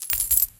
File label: Money Taken; Atelier